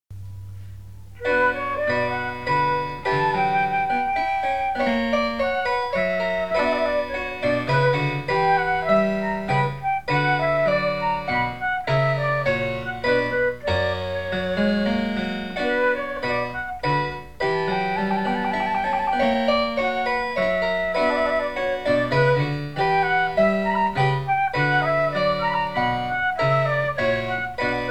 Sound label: bach minuet